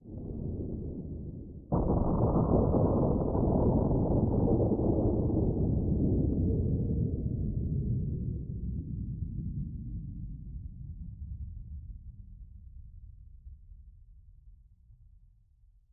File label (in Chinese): 下界荒地氛围声; ambient